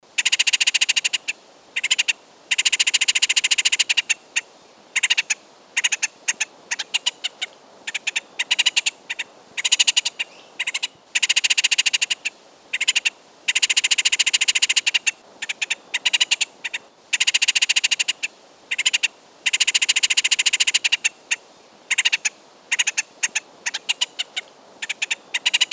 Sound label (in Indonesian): suara pelatuk palamerah